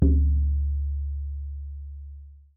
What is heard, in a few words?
African Drum